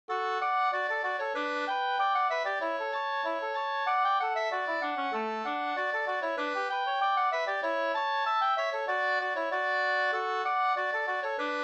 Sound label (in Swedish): polka polkett; e Axel Andersson Nykoping Flen146 2st